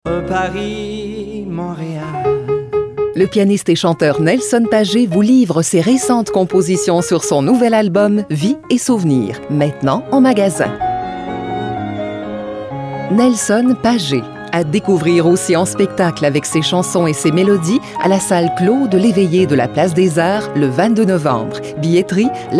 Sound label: Jingle